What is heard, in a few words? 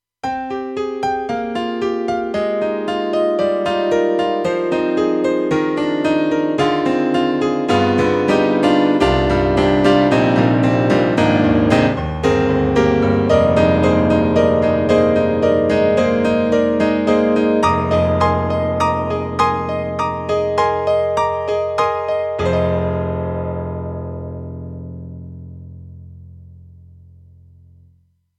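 YAMAHA TG300 Acoustic Piano 2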